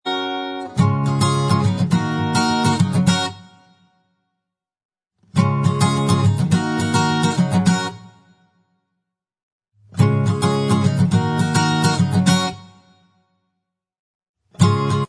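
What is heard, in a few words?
instrumental, backing track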